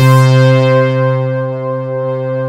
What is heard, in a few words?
PULSE CHORD, TECHNO; Pro-Rec - Synthsonic; Z1 TECHNO